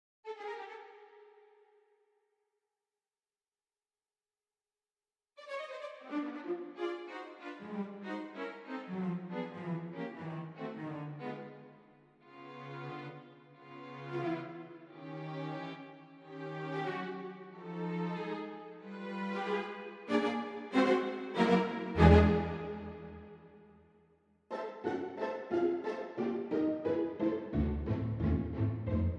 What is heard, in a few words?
strings